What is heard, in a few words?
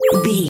ui menu button click